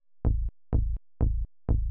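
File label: synth bass, house, 125, A; eJay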